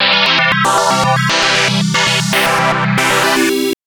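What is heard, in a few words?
Synth FM Pad major c2; TrashLibrary; FMCycesPad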